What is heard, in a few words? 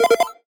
win; Amatic; Lucky Little Devil